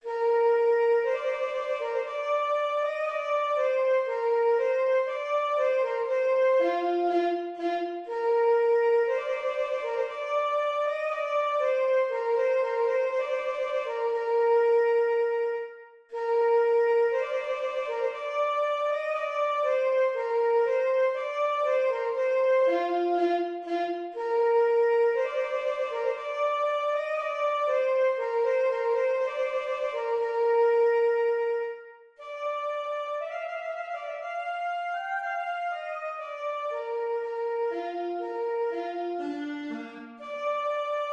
trumpet voluntary; clarke jeremiah

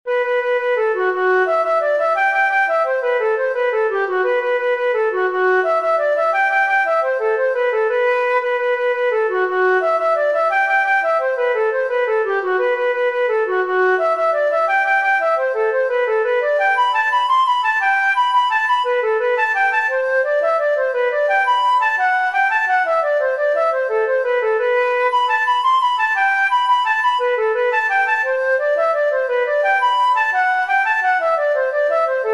for flute oboe harp; the piper chair

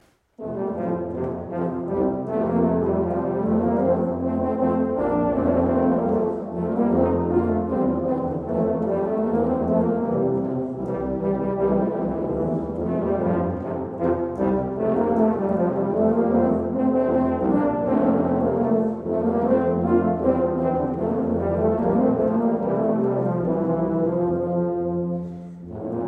fanfare rondeau; mouret jean joseph

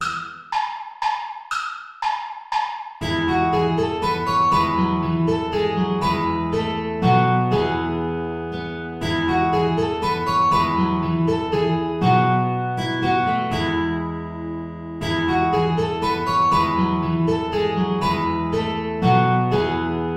saltarello play along without guitar

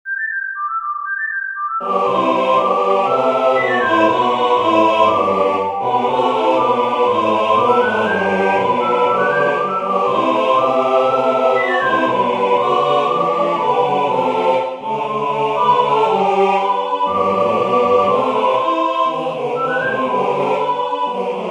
Christmas song

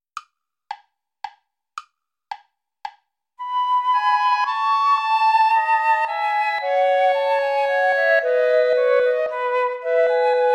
minuet major play along without guitar

